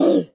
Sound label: MONSTER Deep Hurt Subtle; MONSTERS & CREATURES; mono; Universal Sound FX